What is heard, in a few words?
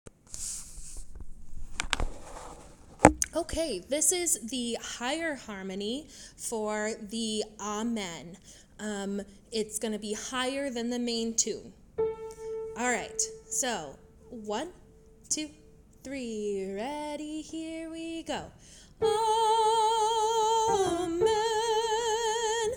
amen higher harmony